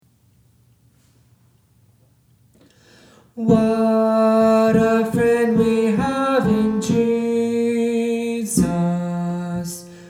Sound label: tenor